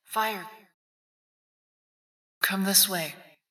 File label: spooky call